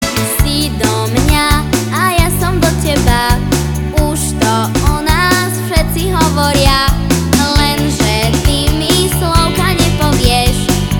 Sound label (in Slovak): pesničky